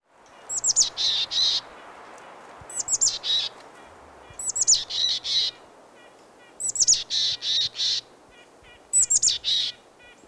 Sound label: Boreal Chickadee Poecile hudsonicus; birds-that-eat-suet